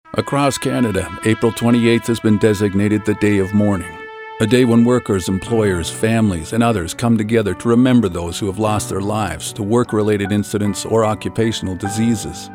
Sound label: taps; ibew; day Of Mourning